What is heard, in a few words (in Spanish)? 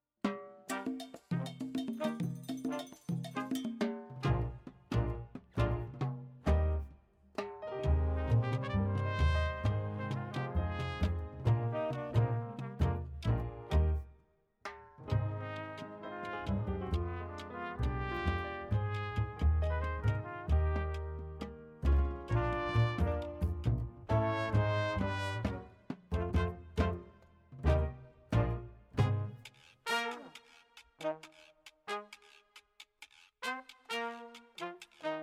Danzon